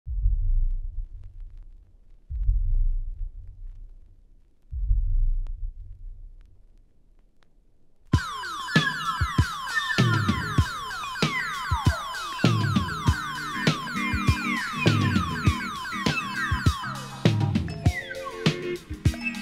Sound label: Cuban Jazz-Funk, Disco & Brazilian Soul